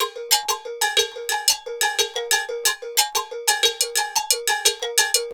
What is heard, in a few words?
Bell Melodic, Percussion Loops